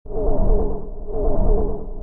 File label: Walk; starbites